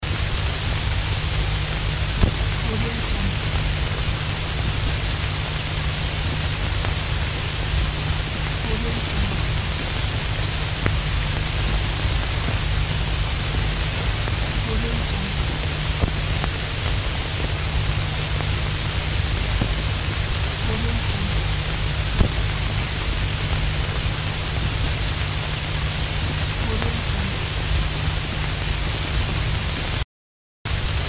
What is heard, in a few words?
vinyl crackle static loop